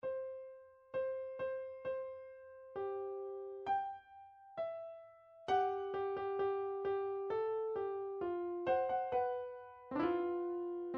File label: ten short piano pieces, midi; shostakovich